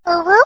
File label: uwu; random events; frogpilot